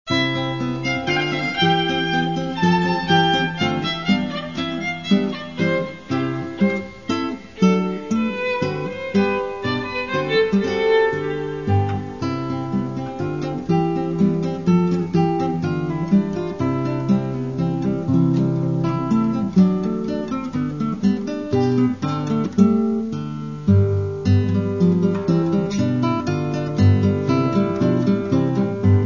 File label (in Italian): cantabile e ritmico